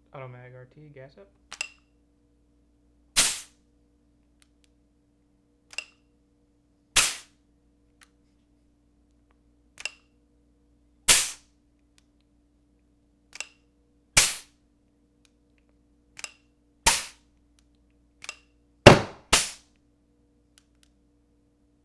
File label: automag gas up raw, guns; automag rt